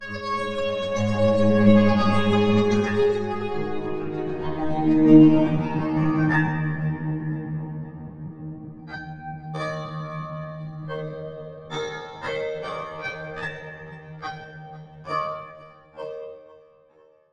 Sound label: KOTO GLS pad; eShop